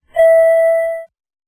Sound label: PanPipe sixth 5-3-1; music